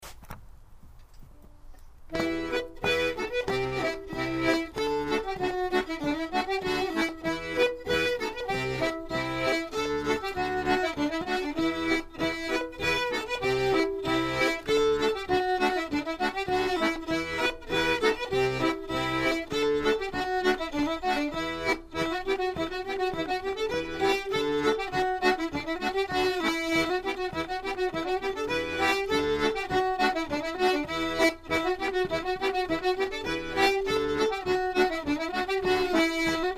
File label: French tune, fiddle